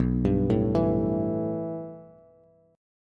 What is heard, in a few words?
B 7th Suspended 4th Sharp 5th B down Bass; B7sus4#5 arpeggiated; chord shape; JGuitar